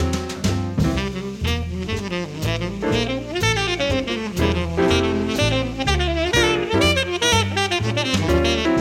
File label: lick